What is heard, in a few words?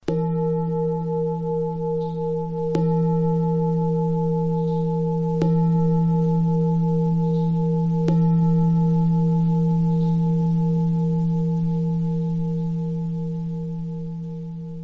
Tibetan Gong Low